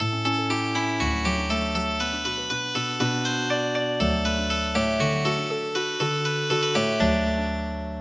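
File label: Healing Harp; harvests festival song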